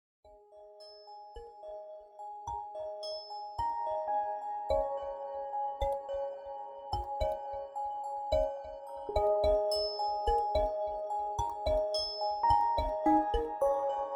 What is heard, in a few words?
Env Dreamy Island, ringtones; LG K92 5G